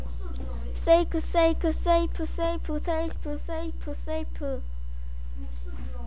shake

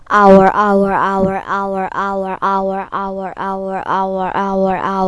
owl

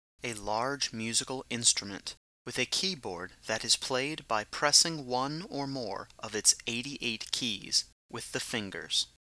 piano

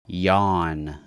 yawn

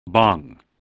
bong

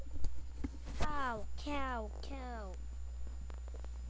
cow

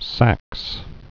sax

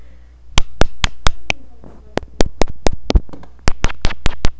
frog

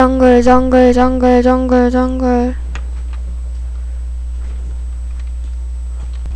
jungle

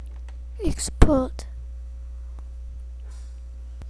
explode

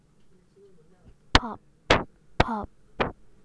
pop

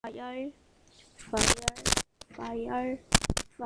fire

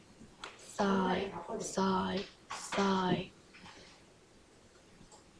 sigh

